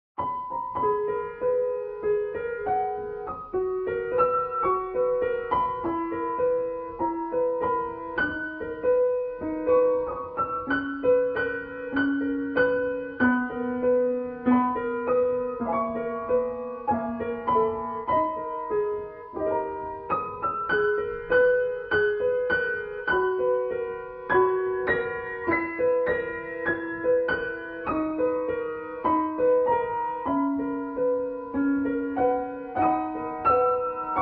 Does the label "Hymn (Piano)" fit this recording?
Yes